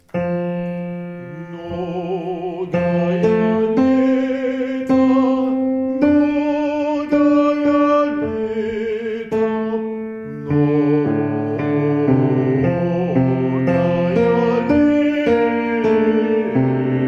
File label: Basses; MNOGAJA LJETA